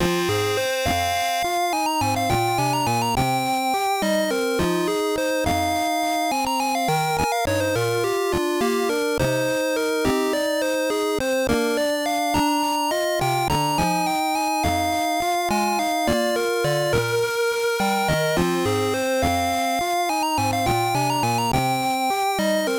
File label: Unused Song; Olympic Summer Games GB